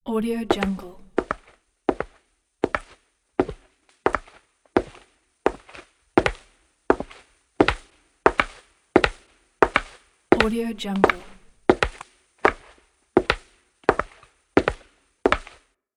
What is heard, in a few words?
footsteps; anderington